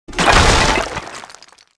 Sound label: fence stone wood; World of Tanks Blitz